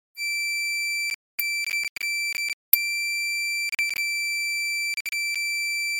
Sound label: audio stutter